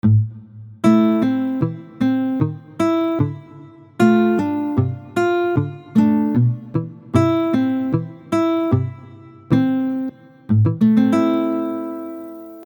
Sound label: palm mute